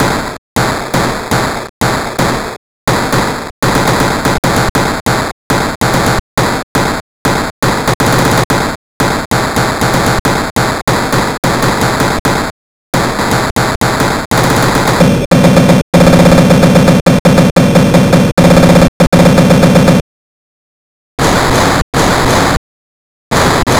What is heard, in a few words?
impulses